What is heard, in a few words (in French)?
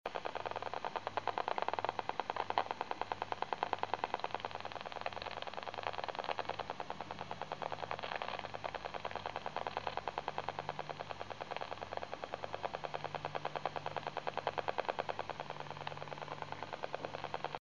WiFi signaux